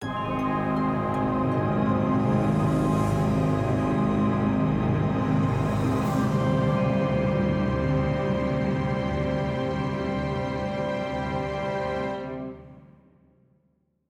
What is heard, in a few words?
Stinger; Relic Stinger